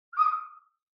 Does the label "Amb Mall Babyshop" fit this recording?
No